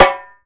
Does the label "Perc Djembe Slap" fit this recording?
Yes